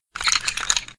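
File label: level start tiles only reshuffle; inlogic-zootrivia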